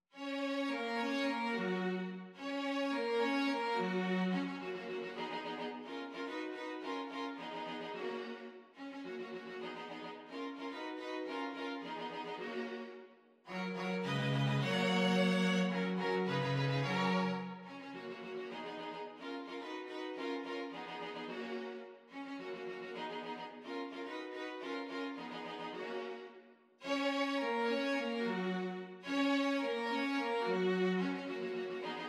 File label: string quartet